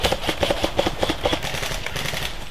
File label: Airsoft gun sound